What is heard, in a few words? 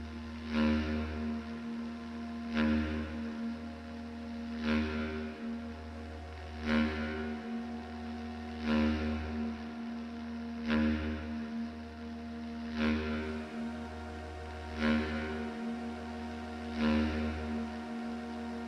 sitar